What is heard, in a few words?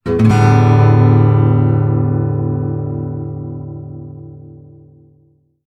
Guitar Strum; serious sam siberian mayhem 2022 pc gamerip; Easter Egg